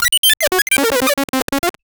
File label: 8bit error sound glitch; mistake